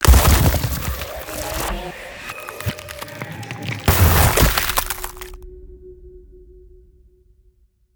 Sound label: Ice Spell Ultimate Absolute Zero; Ultimate Ice Spells; Arctic Aura